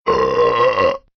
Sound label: Belch Sms